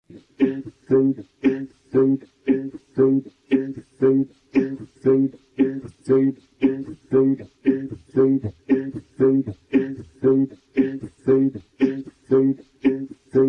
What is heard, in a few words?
hosein hosein vocals; ذکر حسین حسین